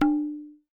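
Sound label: TABLA right; WorldSounds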